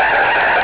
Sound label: car